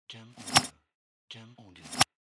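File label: Office Stapler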